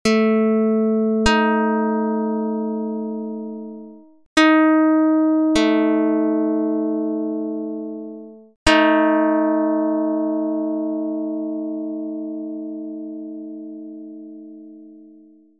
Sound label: pluck; Jid 10 7; dr220